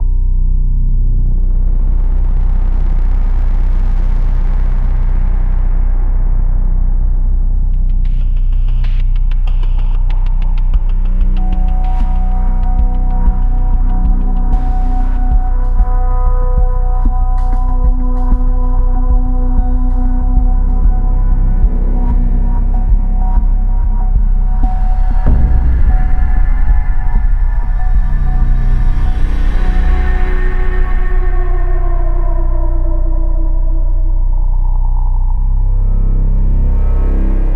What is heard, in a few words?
Thunder Hill Ambient; modern combat 3 fallen nation